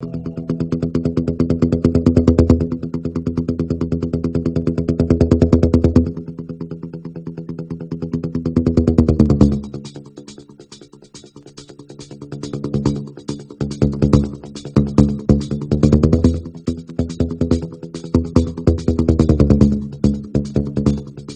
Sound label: drumbone